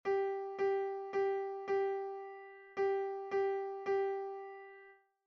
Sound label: syncopation